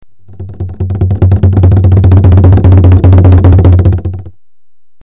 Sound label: roll